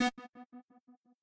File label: synth